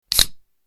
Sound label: can opening